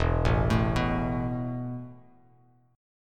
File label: E Suspended 4th E, down, Bass Standard; Esus4 arpeggiated